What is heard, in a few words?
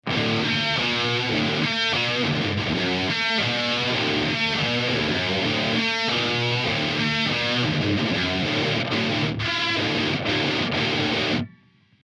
jcm800 eq, gtar